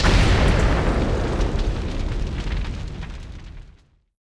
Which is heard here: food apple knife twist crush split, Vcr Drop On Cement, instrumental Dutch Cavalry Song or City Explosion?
City Explosion